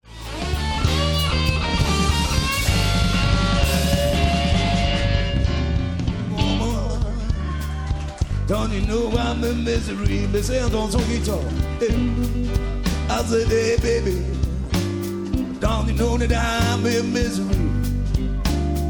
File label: blues